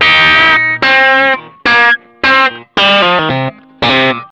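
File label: blue riff, Vintage Blues Guitar; USB Soundscan vol.22; AKAI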